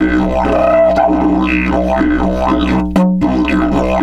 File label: 120, Didgeridoo